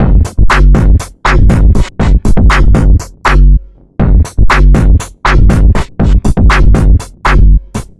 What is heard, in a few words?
deep hip 120bpm